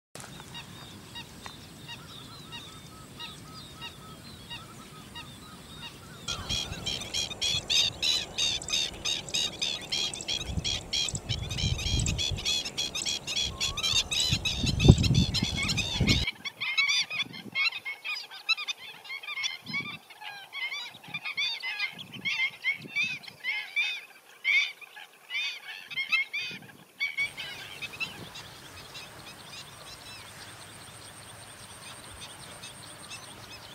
Stilt

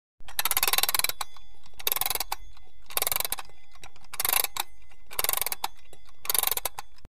wind clock

